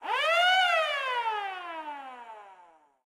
volcano alarm, flight control; CosmicRage; ships

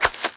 hands, wear

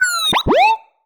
happy, baby

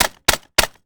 hammer, dark matter mining

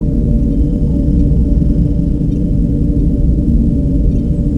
vehicle bay, ships rooms

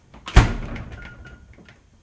fridge close; CosmicRage

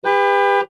horn, vehicle

